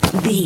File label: foley object grab pickup rough